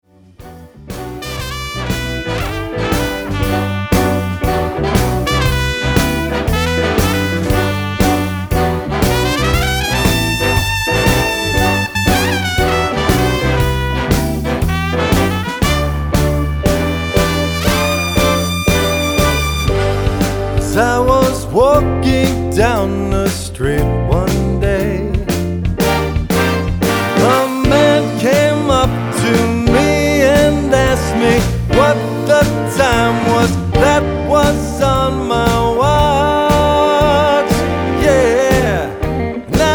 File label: big band